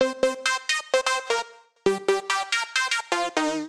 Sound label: Fuzz Pluck (Cm) 130bpm; Music Loops; Sledge Fuzz Pluck; Ableton; Factory Packs; Singularities